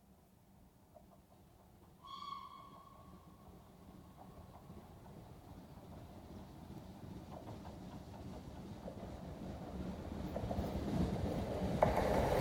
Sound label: Steam Train passes at high speed with track noise & whistle, right to left; BBC